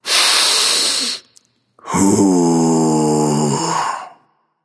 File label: nv breath, vox; smite; voicepack; vulcan